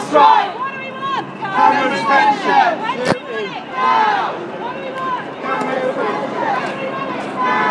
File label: back of the march chanting as they past westminster